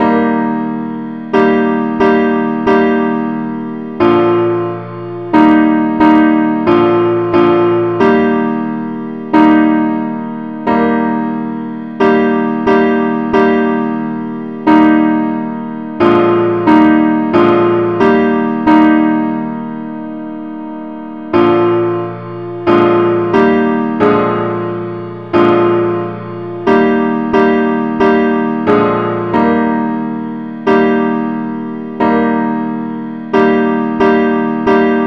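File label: simple accompaniment key E flat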